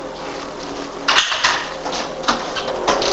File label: unknown clanking; Morrison Lodge 76; EVPS